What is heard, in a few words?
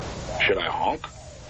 honk; supernatural